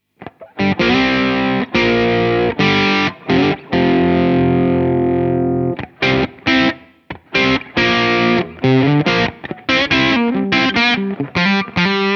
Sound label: Rangemaster Classic Rock